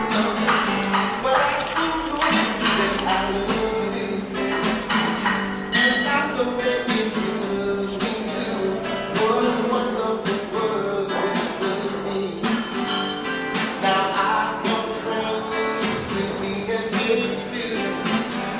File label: guitar